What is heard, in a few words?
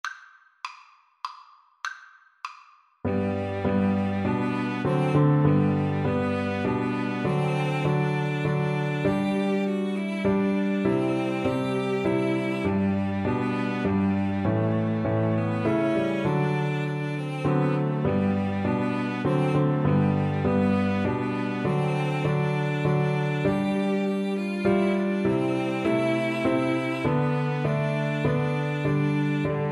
STR TRIO